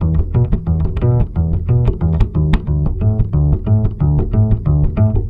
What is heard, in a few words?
BASS, Balkanish Traditions